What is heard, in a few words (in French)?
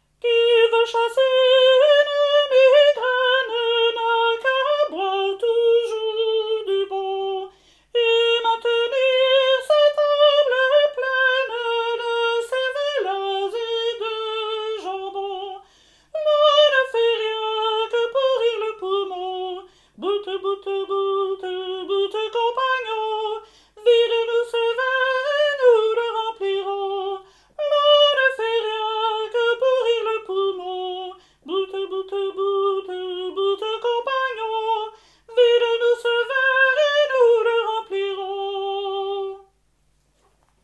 chanson a boire soprani